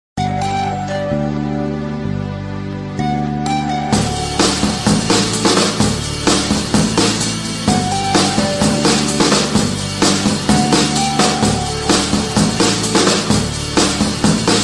ericsson classic, ringtone

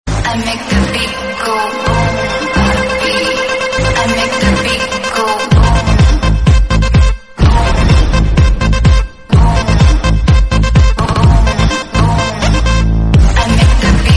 sicilian italian tune

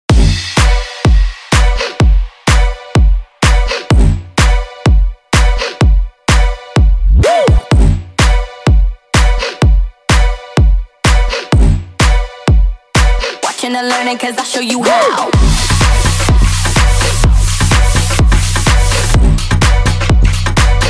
electro

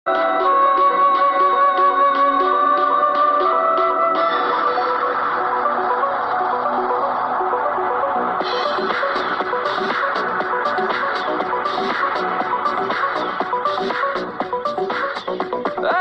cool sad emotional banglas

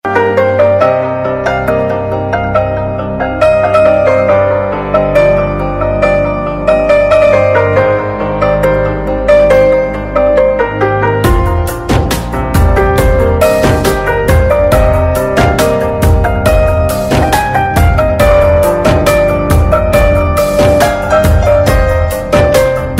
love music

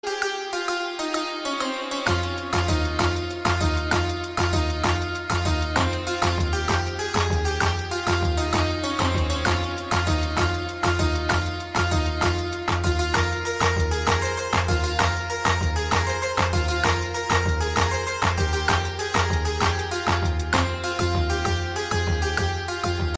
piano tune

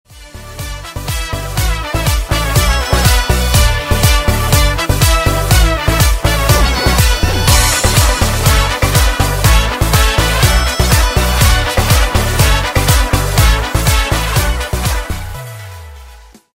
instrumental

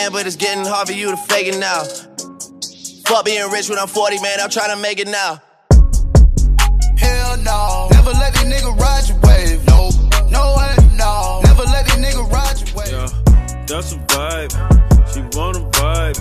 new hip hop

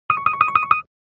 mellow notification

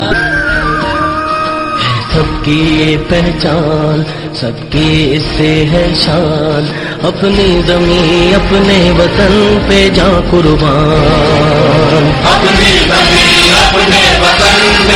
pakistani

